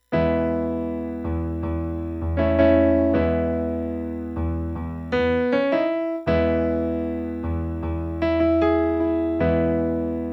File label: We Give Thanks Karaoke Version